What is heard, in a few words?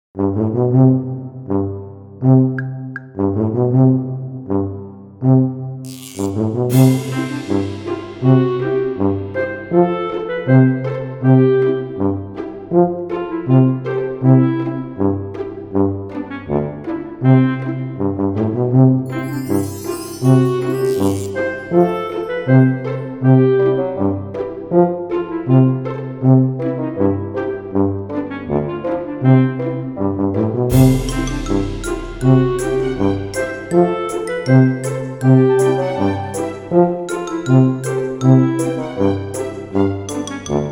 fun comedy